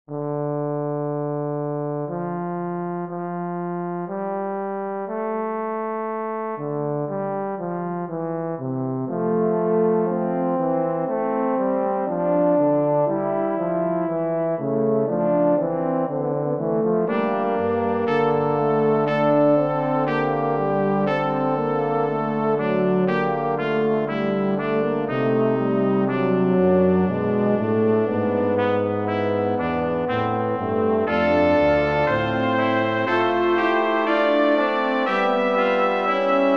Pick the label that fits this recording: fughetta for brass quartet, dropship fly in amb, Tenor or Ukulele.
fughetta for brass quartet